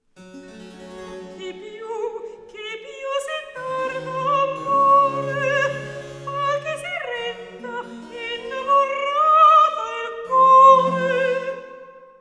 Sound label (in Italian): Recitativo; Che piщ si tarda, Amore; Alessandro Scarlatti; Duet Cantatas